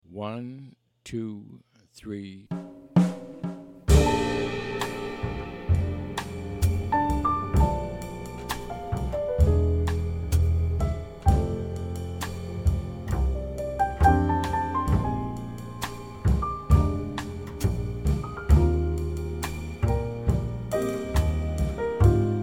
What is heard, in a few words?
minus melody